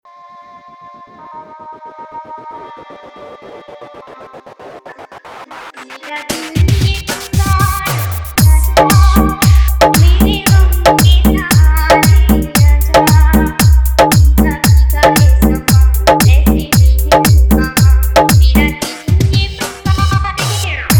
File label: 90s Hindi Dj Remix Song Hard Dholki Vibration